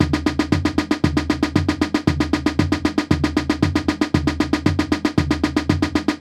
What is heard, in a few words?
Strait Beat